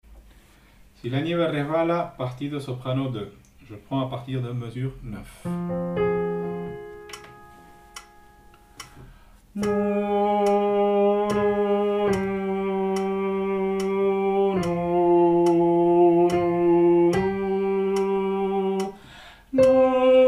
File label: Soprano